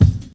90s hip hop kickdrum sound g key

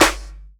nice snare one shot g key; snare drum samples

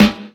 phat snare sample g sharp key

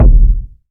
low bass drum sample d key

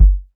dry kick sound g key

